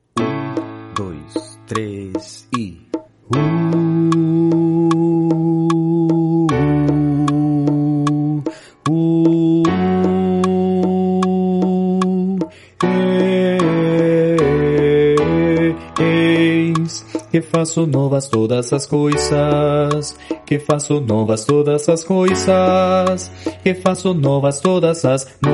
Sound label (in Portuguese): Faco novas todas as coisas baixo